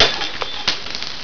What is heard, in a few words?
GLASS